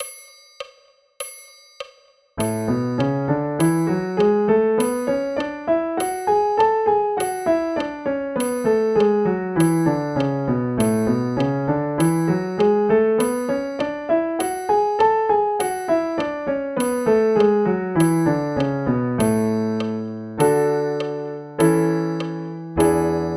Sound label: A Major Scale and arpeggio; bastien